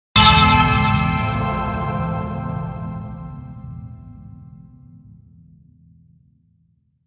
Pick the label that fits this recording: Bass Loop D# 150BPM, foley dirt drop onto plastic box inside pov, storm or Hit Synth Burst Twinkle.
Hit Synth Burst Twinkle